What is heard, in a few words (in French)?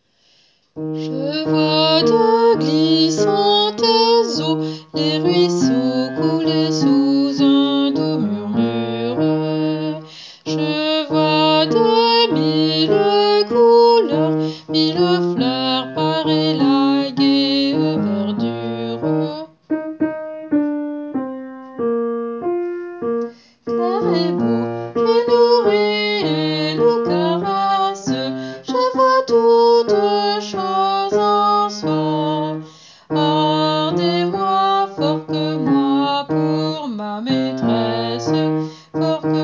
je vois de glissantes eaux basse